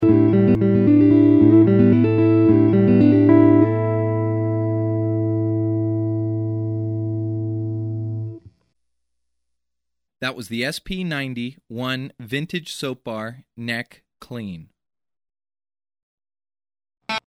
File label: P90 neck clean